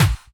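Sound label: snare drum bass drum, Dance Mega Drums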